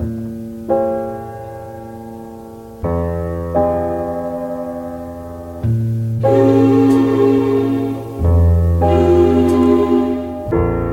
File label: piano, Loops Sad